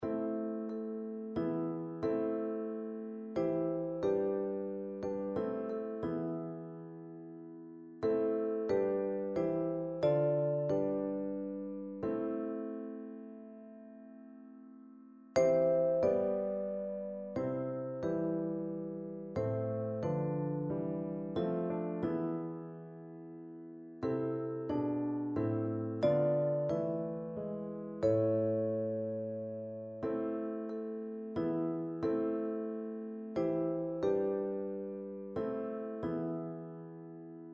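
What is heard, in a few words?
vibraphone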